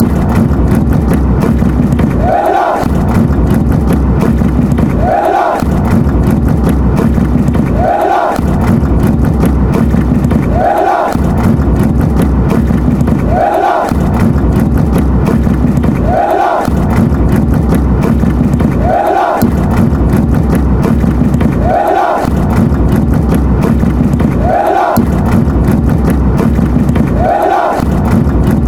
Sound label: greece chant